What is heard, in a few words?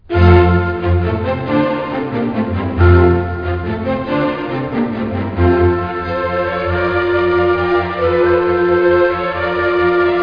mozart piano